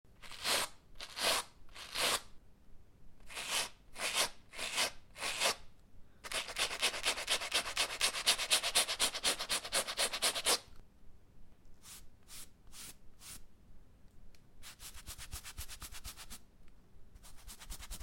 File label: brushing